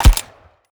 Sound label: p90 fire; STALKER